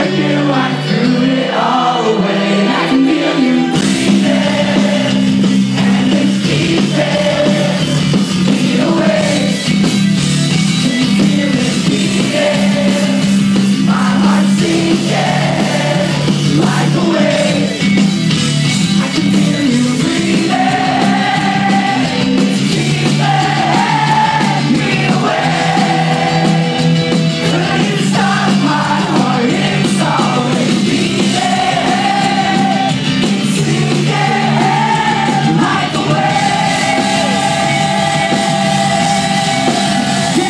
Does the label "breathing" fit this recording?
Yes